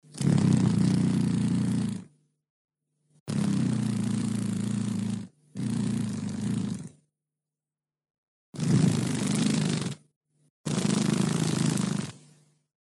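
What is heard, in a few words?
dragonfly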